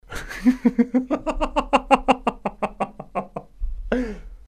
laugh

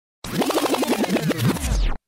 dj record rewind sound effect

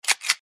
gun cock

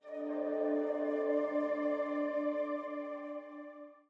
Mmhhh

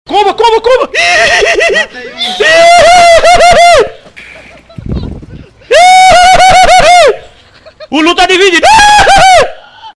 huahuahuahuaa

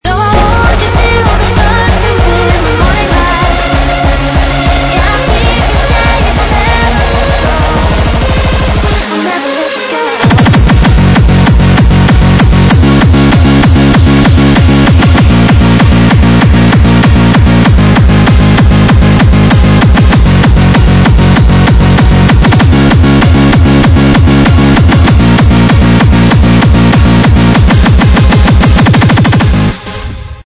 frenchcore

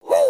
reverse gnome

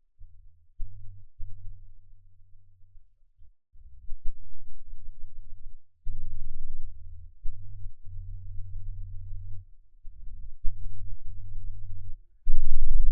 bass boosted astronaut

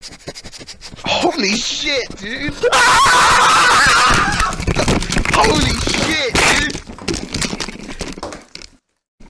punches; tyler1

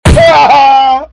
gunshot cry

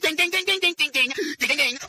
ding ding ding ding ding ding ding ding ding

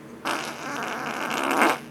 real fart

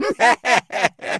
laugh

